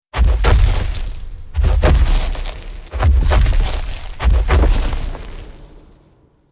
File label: Herbivore dinosaur giant footsteps